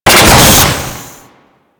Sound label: Drone Eject; Enemies; DE; Warframe; Vay Hek